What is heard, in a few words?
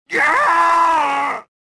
death; reborn